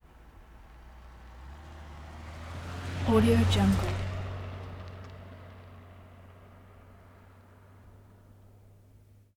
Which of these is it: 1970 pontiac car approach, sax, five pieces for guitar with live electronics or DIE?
1970 pontiac car approach